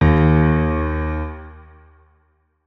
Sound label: notes, keyboard piano; Hideout Furniture